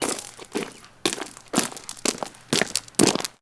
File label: Footfalls Gravel; footsteps